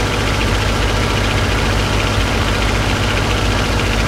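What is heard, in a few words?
zero cockpit right, engines; War Thunder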